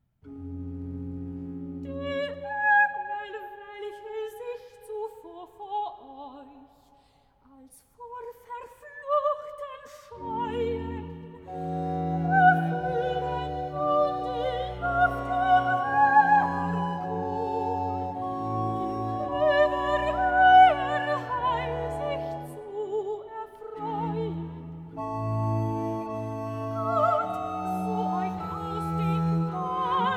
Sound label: recitative